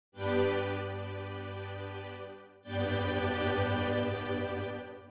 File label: forte chord; Nikolai Rimsky Korsakov